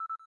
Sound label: cam timer last